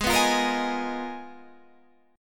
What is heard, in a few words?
Ab Minor 6th Add 9th, Ab, Guitar, Standard; strummed; JGuitar